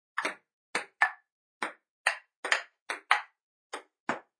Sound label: Ping Pong, upclose recording of a ping pong volley; High quality upclose recording of a ping pong volley; Sports